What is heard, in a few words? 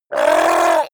goblin scream short pain